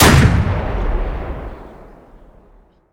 Automatic M242 Single, Weapons; DCS

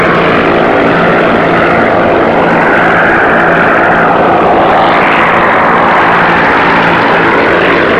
engine bomber rpm50 far; War Thunder

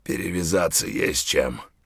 bleed in; Escape from Tarkov

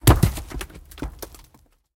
rock fall; tanks; War Thunder

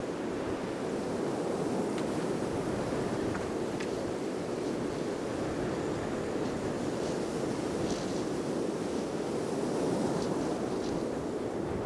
hangar winter loop

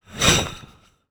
catwalk slip

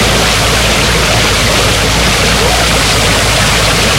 engine water rpm, engines tanks; War Thunder

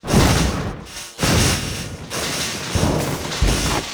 generator overload

